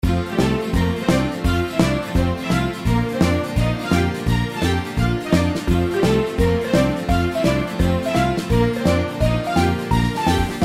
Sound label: outro bgm; Jelly Mania